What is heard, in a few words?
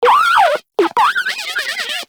Moa Compassionate Vocal Cold Shiver; Warframe